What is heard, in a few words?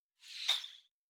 Fly By Whistle